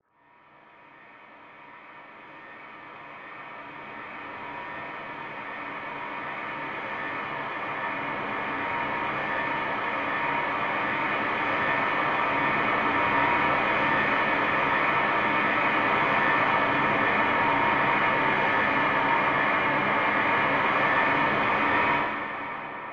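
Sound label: approach of a huge army of locusts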